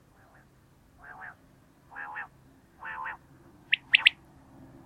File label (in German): wachtel